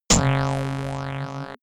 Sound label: buzzy alarm